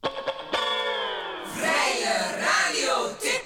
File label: jinglepackage; topformat LP jinglepackage 1